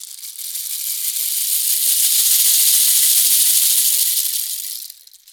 ETHNO EXTRA 90; ethno2

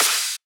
clap snare; Anthemic

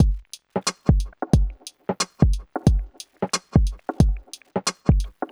90 Tape Beat; Touch Loops; Textural Electronica Mini

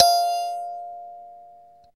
KALIMBA G3; ETHNICSTUFF

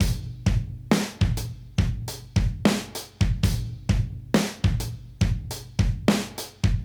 Modern Breaks; Loops; Touch Loops; ClassicBreakSessions

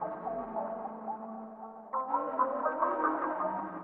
ethno, 125, A

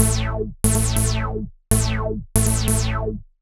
ELECTRO BASS 140 A

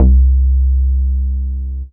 BASS MONO

